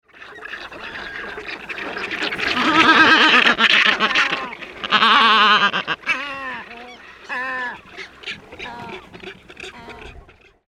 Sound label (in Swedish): Svartbrynad albatross